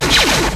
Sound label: LAZERM; Best Service; Advanced Mega Effects